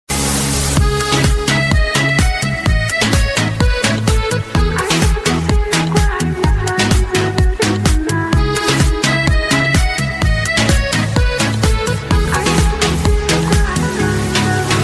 samsung s8 romantic tone ringtone

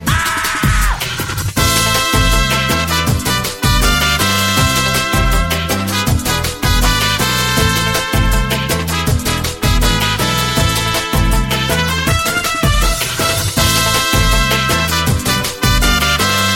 mexican romance ringtone

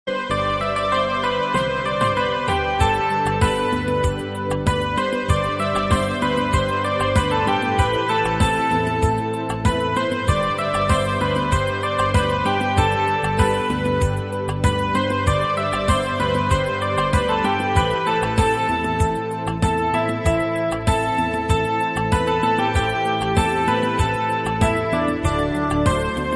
instrumental ringtone

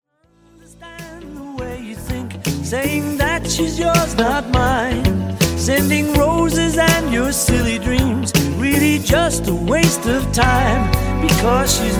old ringtone

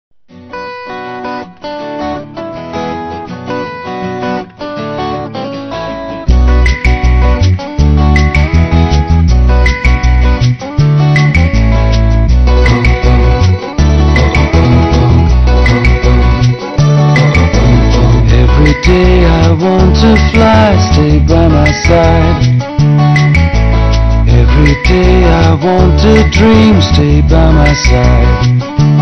soft music ringtone; vodafone

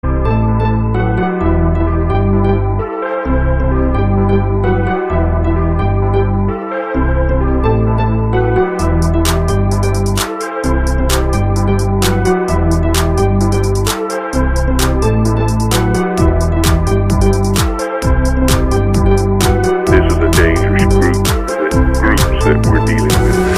trance ringtone; tomorrowland

